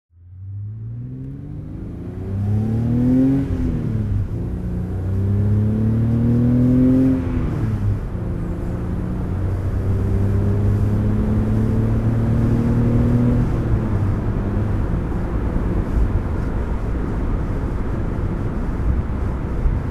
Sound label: bmw z1 drive